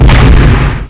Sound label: explode; asciisec